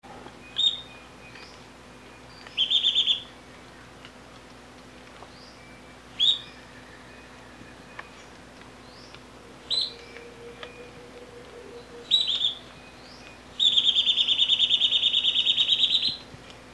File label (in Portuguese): canto do chupa dente